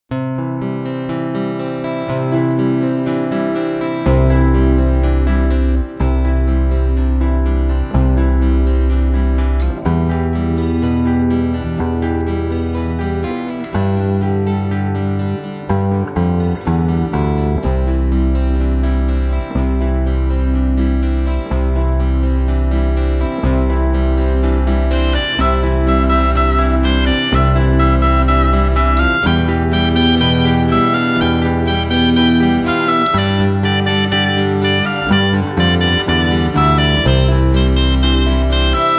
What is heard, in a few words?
Instrumental